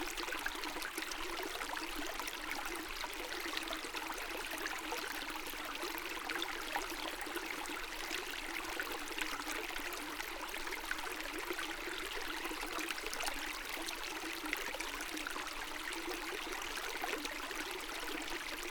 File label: Small Running Creek